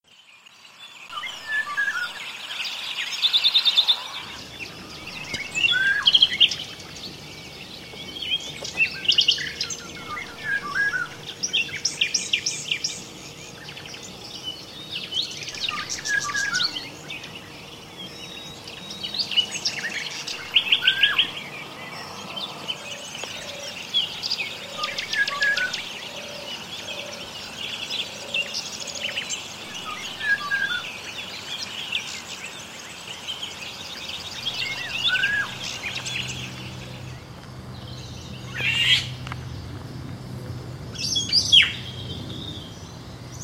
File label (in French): Rossignols fondu avec lauriot; Tautavel